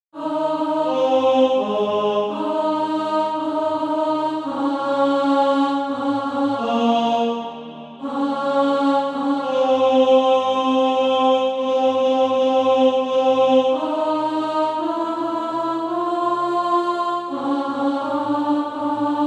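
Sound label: alto, Practise Music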